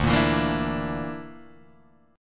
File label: D Suspended 2nd Sharp 5th D, Guitar, Open G